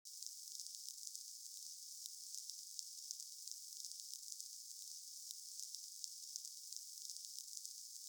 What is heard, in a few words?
dynamite fuse; weapons; grubs